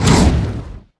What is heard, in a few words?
spider monster whoosh